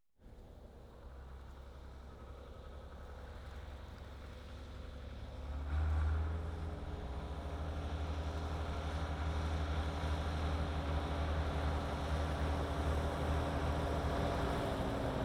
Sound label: Tractor Two Ford Tractors And, Farm Machinery; BBC Sound Effects Library